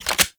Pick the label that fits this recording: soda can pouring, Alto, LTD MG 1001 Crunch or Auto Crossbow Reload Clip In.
Auto Crossbow Reload Clip In